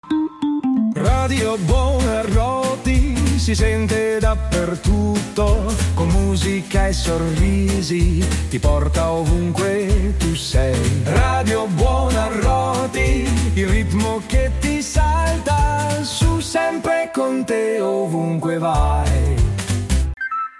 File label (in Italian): jingle radio; Radio Buonarroti